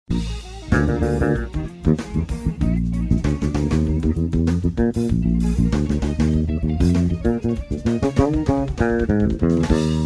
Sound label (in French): basse